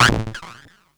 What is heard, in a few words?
EFX, SFX KIT; Wizoo - Platinum 24 Electronic